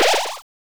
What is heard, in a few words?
power; deltarune yellow translation